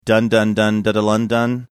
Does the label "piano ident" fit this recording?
No